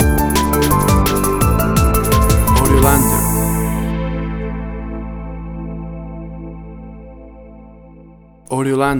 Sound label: Bouncy Drum and Bass Rhythm Stinger